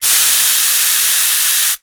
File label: Aerosol Can, Bathroom; 1001 Sound Effects